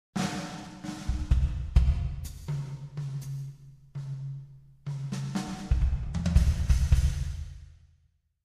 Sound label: fills